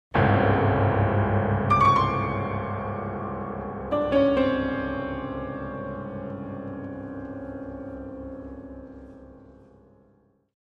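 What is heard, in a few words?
Grand Piano Long Tension; keyboards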